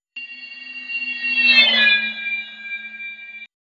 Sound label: railway wheel; vibroacoustic group